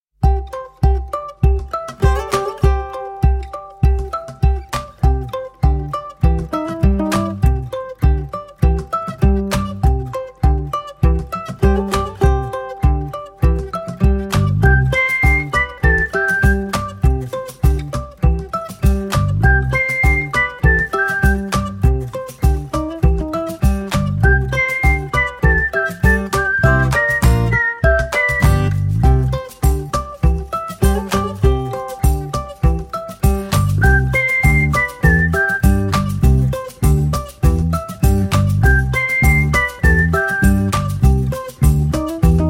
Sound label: whistling music; dolphin tunes